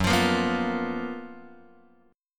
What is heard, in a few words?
F sharp Major 11th; F#M11 Chord {2 X 3 3 0 1} strummed